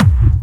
Medium, kicks

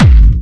Harder, kicks

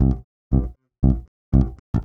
Quarter Notes, Bass Drum - Kicks

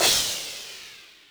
CYMBAL, Yamaha RM 50

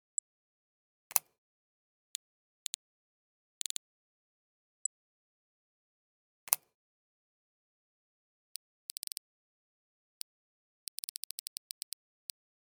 Camera Sounds; Nokia; PureView

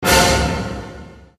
sound shutdown; Nokia Ringtones; 6270; 6270 WAP; Organic Mutation

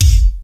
Smash k, Roland JD-990; Drum Samples

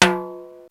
Timbale H, Roland TR-727; Drum Samples

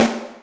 snaredrum, Yamaha RM 50

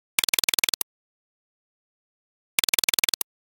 Rattle, Nokia Alerts; Nokia Ringtones; Windows Phone apps

